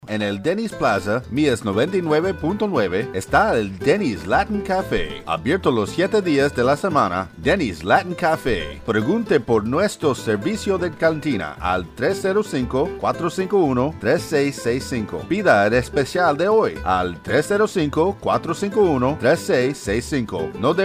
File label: Latin Cafe Barcelona Nights Espanol; Dennys